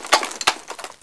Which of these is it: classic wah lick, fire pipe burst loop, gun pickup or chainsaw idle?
gun pickup